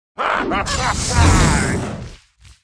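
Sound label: mask attack, monster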